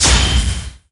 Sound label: give point; Fate